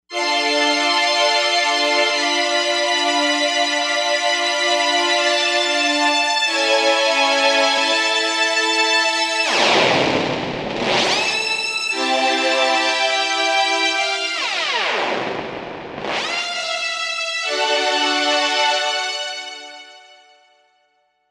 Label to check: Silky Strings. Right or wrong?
Right